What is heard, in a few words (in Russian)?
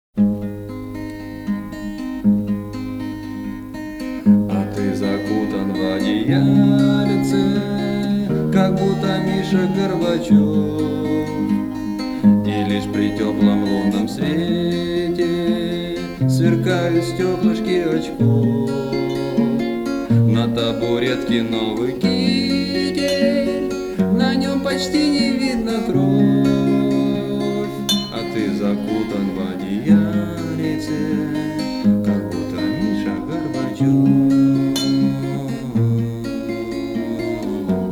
Колыбельная